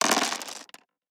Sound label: DICE on Plastic, Throw and Roll, Standard, 16 Sixteen Dice; Samples; ArticulatedSounds; DICE Sfx Party (Extended)